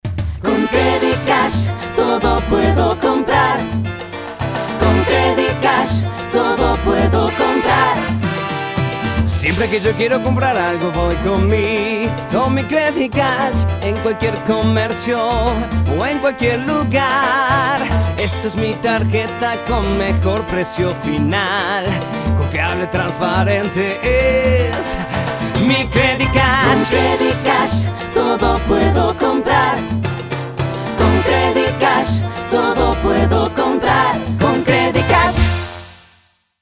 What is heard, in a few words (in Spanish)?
Jingle; Tarjeta Credicash